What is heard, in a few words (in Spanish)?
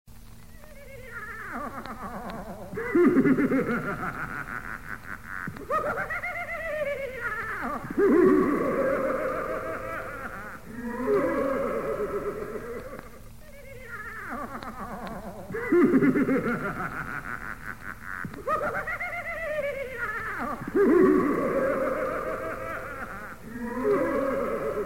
Risas de fantasma